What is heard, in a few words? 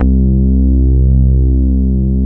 Poly800 C1, Korg; Back In Time Records; Korg Universe VOL-1